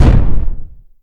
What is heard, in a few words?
proxmine expl